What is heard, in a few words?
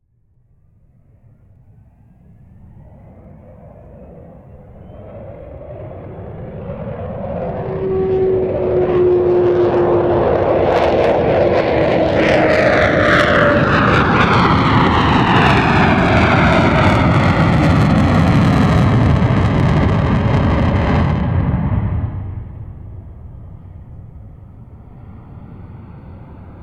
Avro Vulcan Bomber, Over Head Aircraft; Timothy McHugh